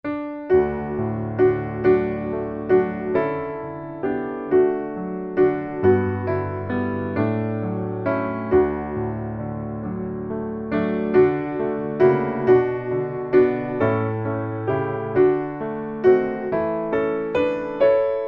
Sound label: piano